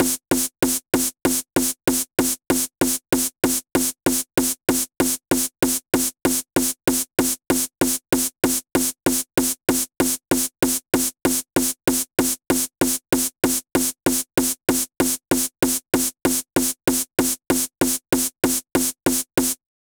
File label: snare